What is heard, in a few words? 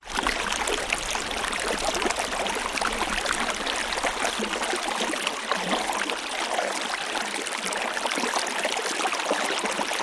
flowing water river shannon